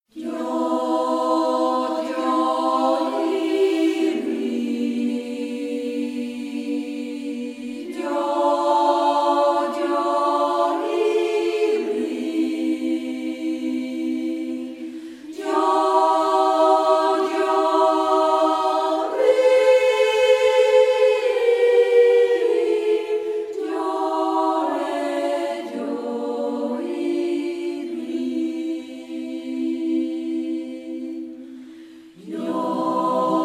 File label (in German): Andachtsjodler